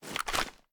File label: reload empty end, weapons; wfoly ar; viper